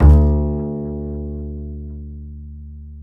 D 3, Bass; Spectrasonics; Bass Legends

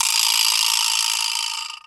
Vibraslap; EMU Orbit-3

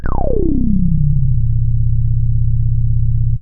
BASS Rez Bass; Sexy; Polyend; Legowelt

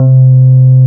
SYNTH, Drum & Bass, 160 BPM; Ueberschall; Drum & Bass Resonance

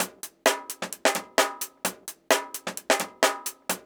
130, DRUMLOOPS

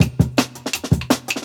10 Pin Beat; Original Jungle Breaks

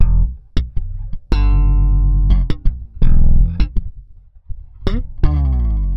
Slap, Bass; Bass X

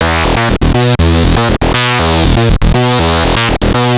Analoop, Industrial Analog; Analoops; Sonic Foundry (Sony Creative Software)

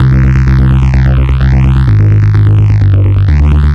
Bass, 128bpm, C, analogue circuit; musicradar

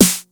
TR909 SD; East West - Global Sounds

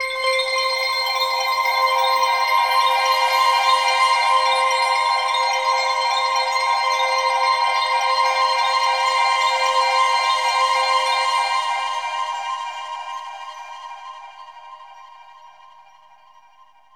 seq atmos; AMG - Vince Clarke Lucky Bastard

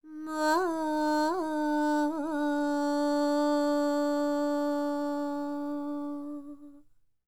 World Voices, INDIA; ILIO; Vocal Planet

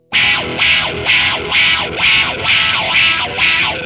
SPANISH, Distortion Gtr; PlatinumPhattCD2; E-MU Producer Series Vol. 8 – Platinum Phatt

